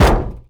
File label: Chaingun Hit